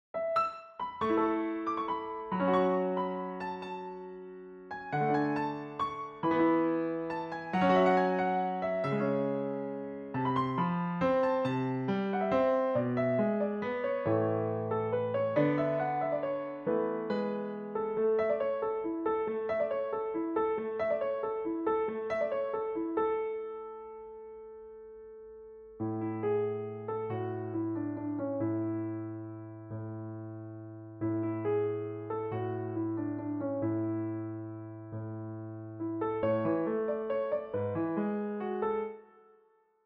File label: solo, piano; Bigil